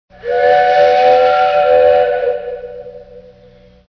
train whistle

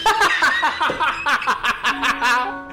long laugh

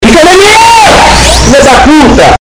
ultimate scream; FICA NO ME!!!!! FILHA DA PUTA

